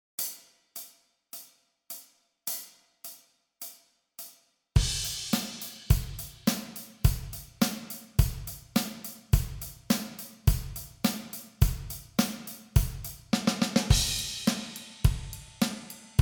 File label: 105 bpm; practice tracks